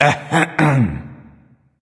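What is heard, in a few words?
nonverbal clear throat; smite; voicepack; ullr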